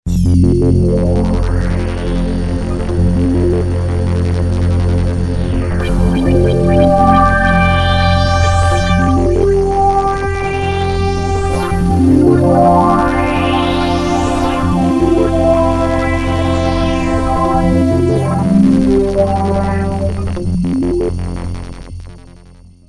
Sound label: lfo pad